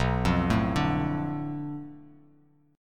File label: B Minor 7th Flat 5th B, chord; Bm7b5; arpeggiated; 7,8,7,7; JGuitar